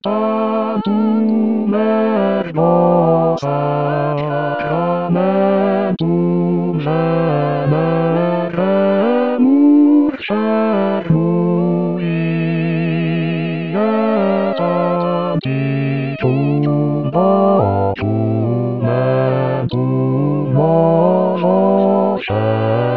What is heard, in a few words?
bass; deodat de severac; Severac Tantum ergo